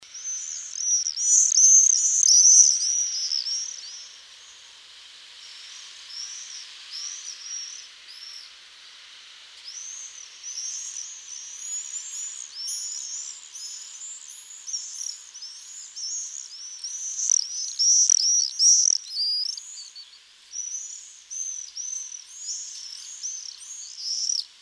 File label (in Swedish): Tornseglare